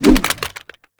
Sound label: Water Balloon Fire; Warframe; Water Balloons